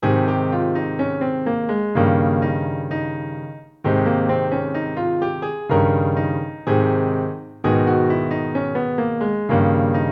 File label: 16th note in A flat and A flat m